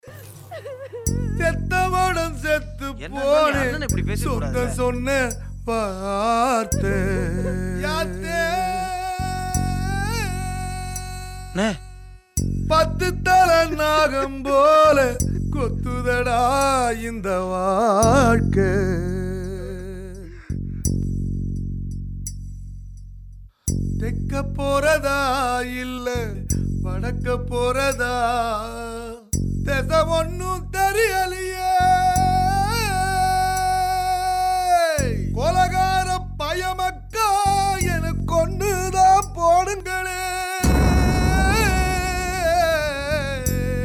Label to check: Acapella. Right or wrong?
Right